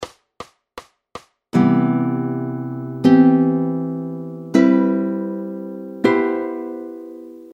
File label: dropchord